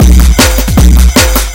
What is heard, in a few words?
beat